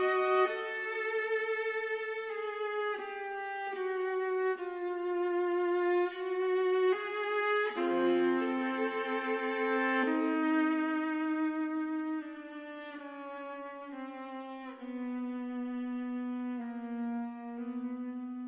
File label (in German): Sonaten für Viola